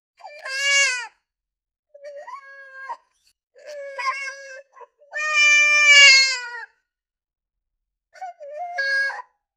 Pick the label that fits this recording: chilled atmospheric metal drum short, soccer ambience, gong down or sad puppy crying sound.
sad puppy crying sound